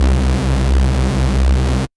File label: C Bass